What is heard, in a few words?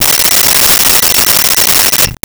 Cell Phone Ring; Communications